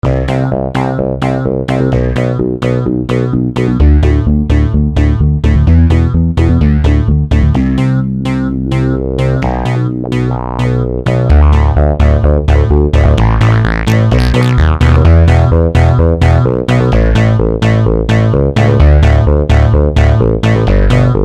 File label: Bass mix